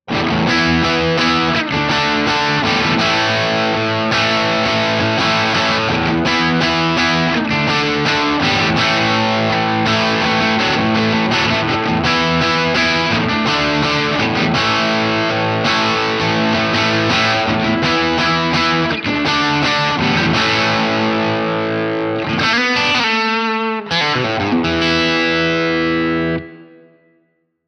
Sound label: crunch; jensentone